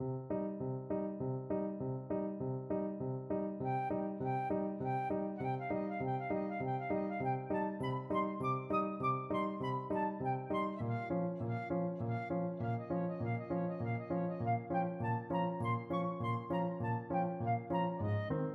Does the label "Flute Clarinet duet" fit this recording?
Yes